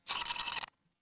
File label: zoomout; fertig-classic-games; Puzzle; vega